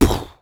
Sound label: Human Beatbox, Beatbox Hits